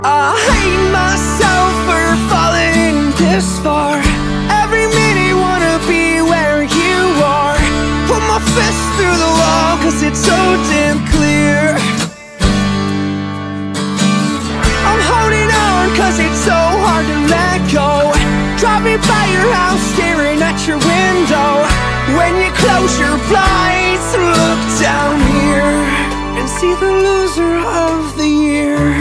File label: guitar; blues